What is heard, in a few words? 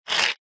money coin australian 50 cent slide short on tiled table; foley